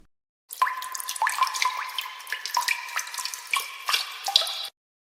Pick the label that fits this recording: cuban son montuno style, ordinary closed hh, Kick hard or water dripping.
water dripping